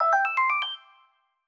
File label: 1-Up